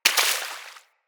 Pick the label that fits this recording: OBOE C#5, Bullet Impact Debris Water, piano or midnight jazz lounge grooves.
Bullet Impact Debris Water